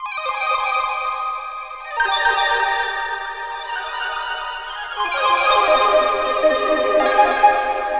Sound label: Alien Guitar, Synth SFX; Sonic Foundry (Sony Creative Software); The Electronic Music Manuscript Collection